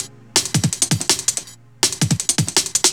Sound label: Camo Break, 3A, Jungle Breaks; Blu Mar Ten - Jungle Jungle - 1989 to 1999 Samplepack